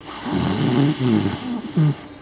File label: sleep female; hotel giant demo